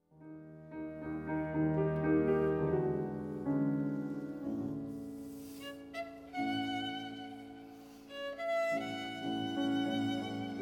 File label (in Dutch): Altvioolsonate